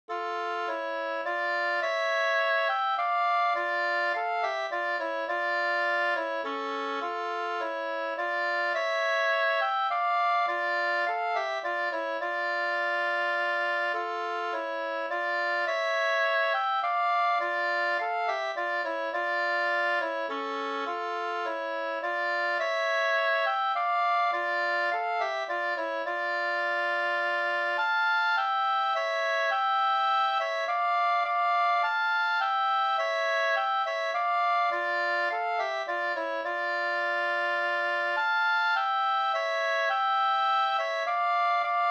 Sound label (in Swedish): langdans d moll; fr Solleron 2st